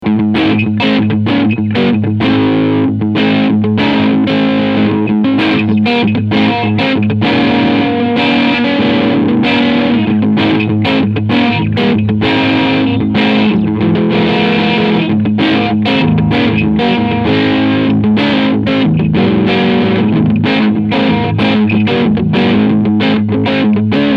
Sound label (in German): Harley Benton Extreme 76 BK Crunch Sound beide Humbucker